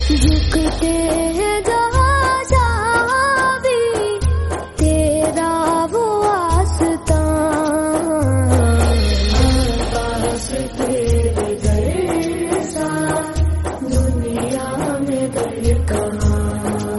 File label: naat arabic